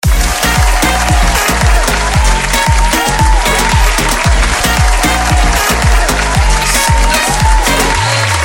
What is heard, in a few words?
applause